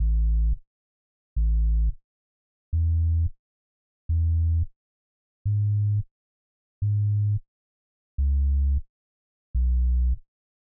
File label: 88 SUB BASS C m BPM 88